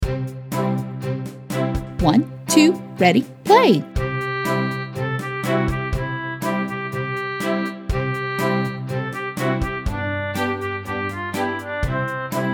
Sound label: WITH INTRO, ukulele; Rainbow Ukulele